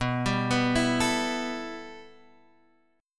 B 7th Suspended 4th Sharp 5th B down Guitar Half Step Down